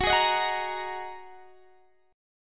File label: A sharp 7th Suspended 4th Sharp 5th F sharp, guitar